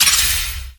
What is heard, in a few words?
collect; DinoReels81WD; wazdan